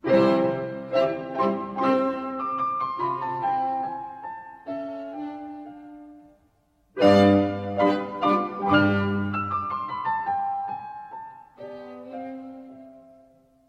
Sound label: Piano Trio in C Allegro; Haydn